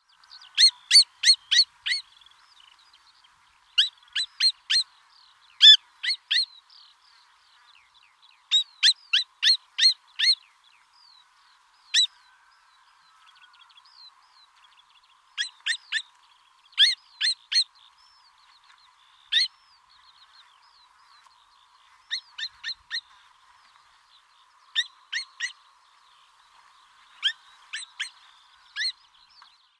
Western Marsh Harrier Circus aeruginosus aeruginosus